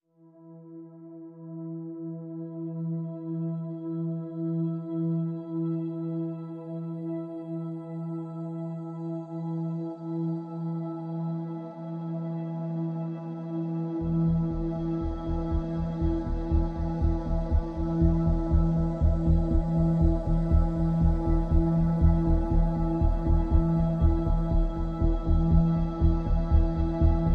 No Melody

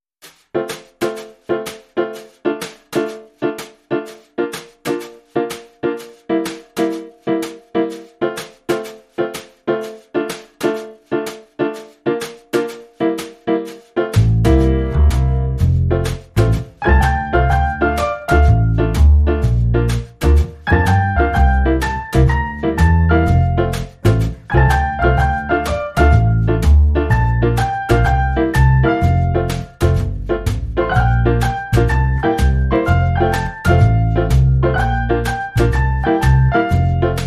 Piano Double Bass and Snarebrush Only

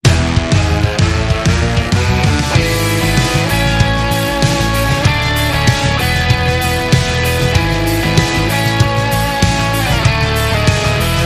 Rock, Jingles

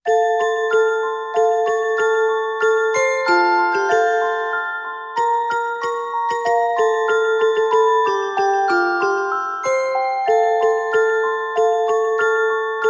Jingle Bells, Carillon Theme No Pad

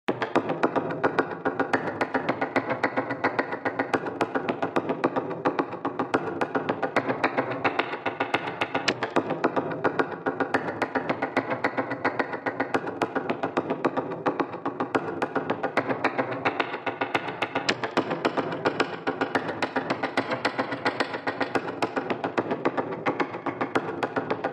No Lead Synth

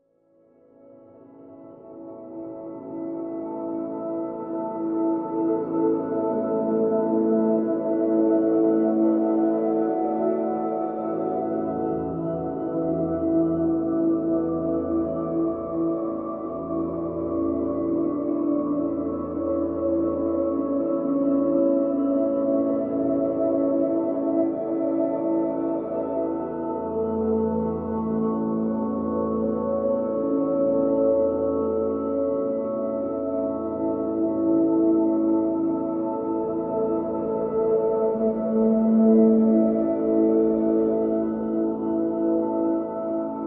Pads Celestial (A)

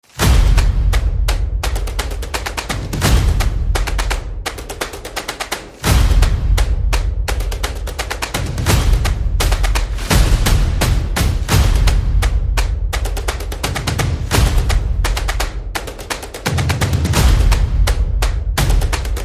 Percussion and Effects

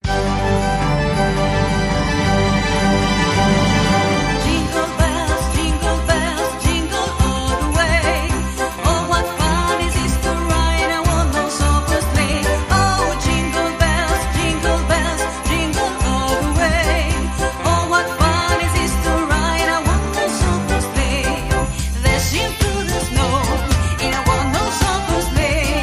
Jingle Bells, Sung Version

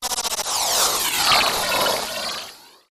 Phased Stutter

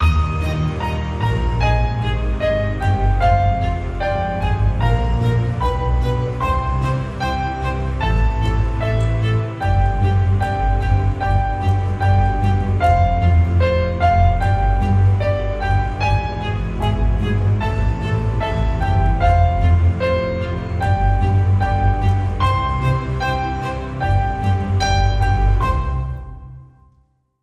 Underscore

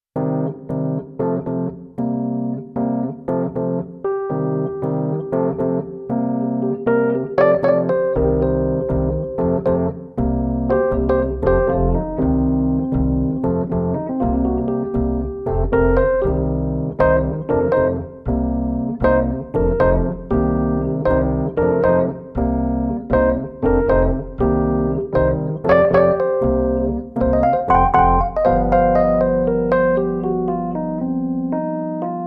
Rhodes

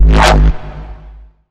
Bend The Fast Lights, G sharp

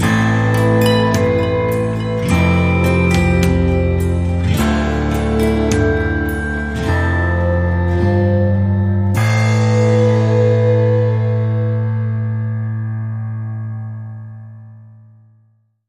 Sting Mysterious

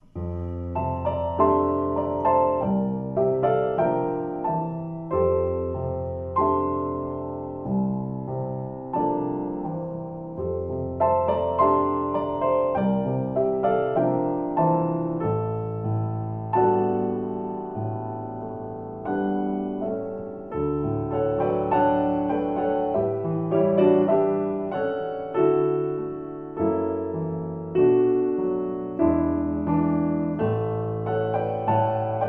Solo Piano

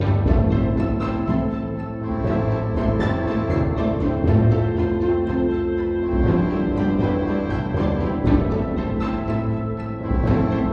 Pizzicato Excitement

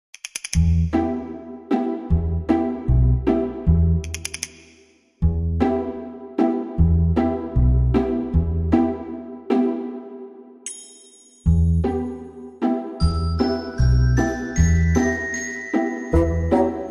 Reduced Percussion Reduced Pizzicato

